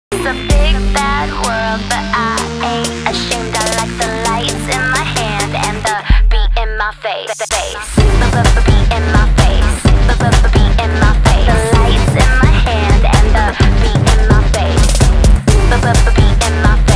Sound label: english ringtone